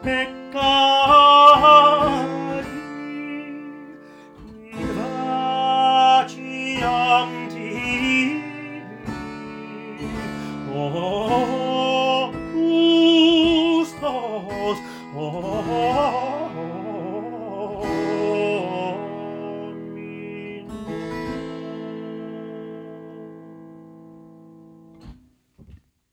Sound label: solo, Trillo, Intonazione, Coloratura or Gorgia, trillo